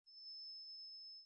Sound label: trumpet